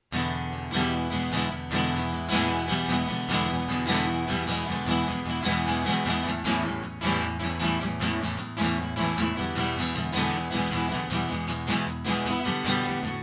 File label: Guitar